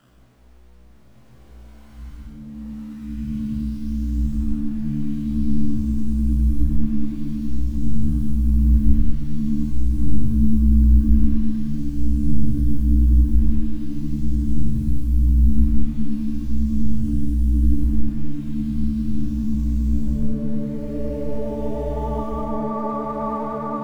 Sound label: background Theme; AR Shooting Unity